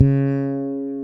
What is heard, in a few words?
Fretless Bass